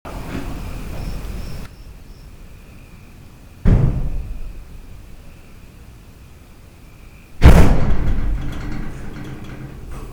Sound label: Horse Barn Door Slam